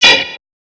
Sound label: Hats, Alesis Performance Pad